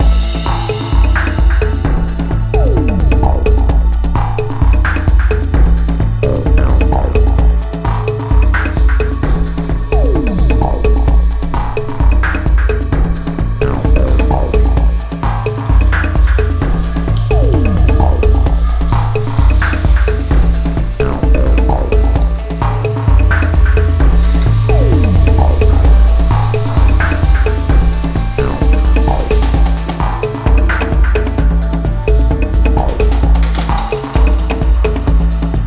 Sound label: setup screen loop; LFS